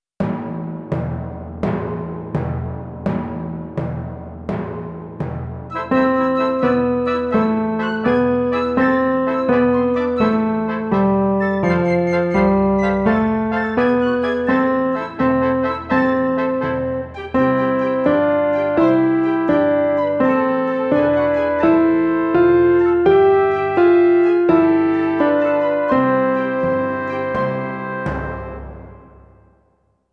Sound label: Sea Song Slow